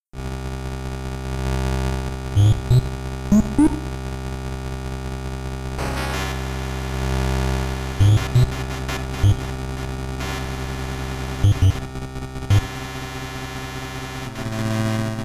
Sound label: Humming Beep; Zexion Soundtrack